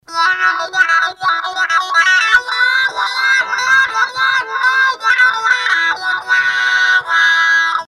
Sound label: Heavy